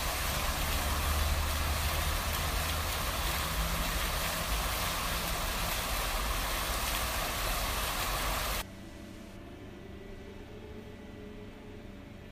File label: Waterfall gushing